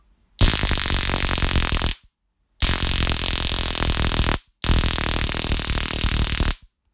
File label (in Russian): zvuk shokera; shoker osa 1002